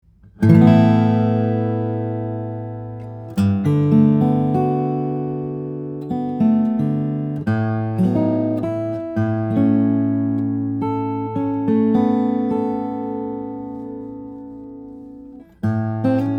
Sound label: Ehlers 15, guitars; brazilian rosewood spruce; model 15